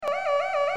sirene incendie

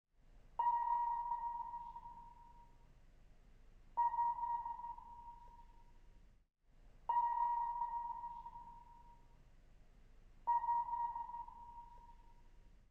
sonar

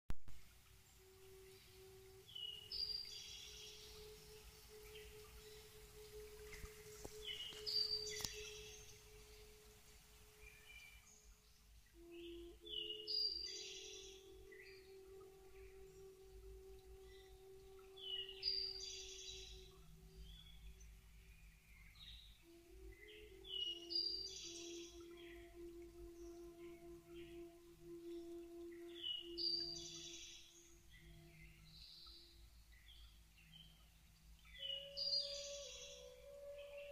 flute amerindienne oiseau

